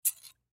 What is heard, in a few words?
Scissors Open; Offices; Foley; Blastwave